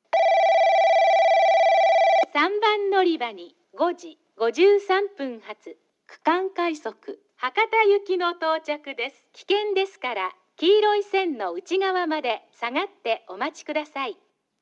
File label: omuta regional rapid hakata, portable recording